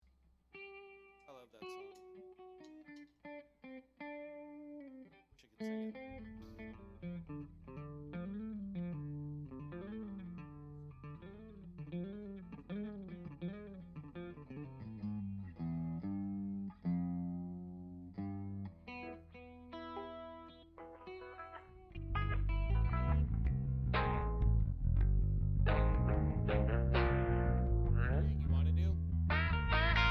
Singalong Mix

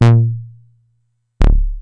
Synth one shot Stab D, Bass; Slate Digital BASSHOLE Sample Pack

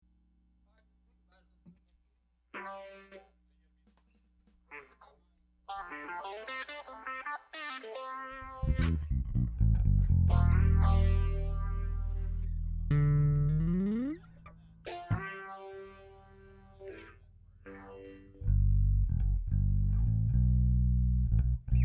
Strings Mix

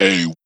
Vocal one shot; Slate Digital Omnivox Vocal Sample Pack

